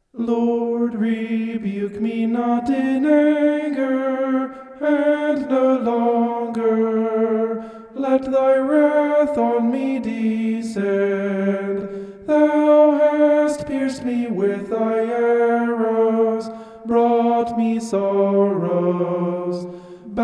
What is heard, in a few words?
vocal homoph; psalm 38